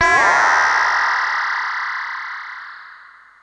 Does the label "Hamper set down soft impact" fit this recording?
No